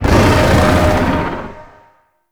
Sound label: Void Dragon Vocal Pain; Enemies; Vocals